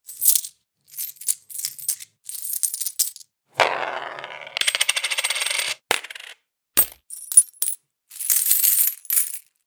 Coins, organic ui building game sfx; APPlicable Sounds

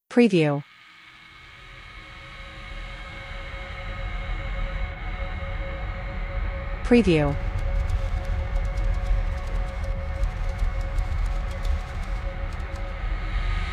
deep noises, creepy ambient music

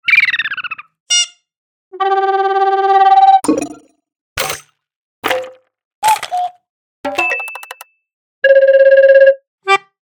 Fun and quirky, organic ui building game sfx; APPlicable Sounds

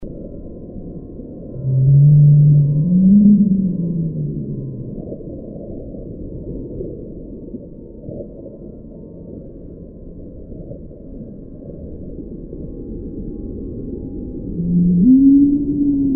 Whale; realistic atmo; realistic atmo pack

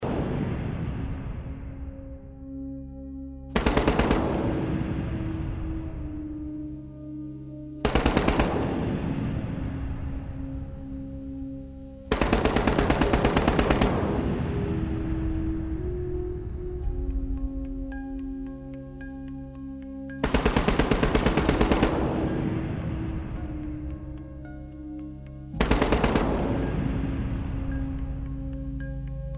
Auto Cannon

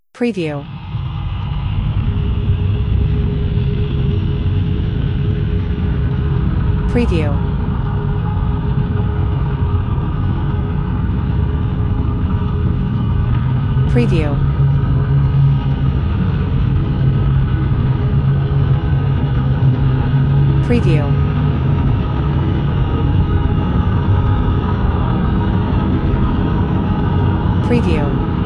Dark Forest, creepy ambient music

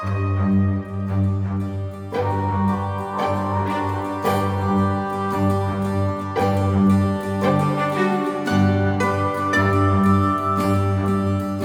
85BPM, enchanted forest fantasy; enchanted forest fantasy rpg kit

